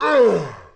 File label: Metal elf Night Damage; Mob